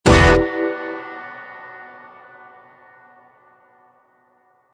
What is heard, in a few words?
clickin; Ambient Clicks; haxw White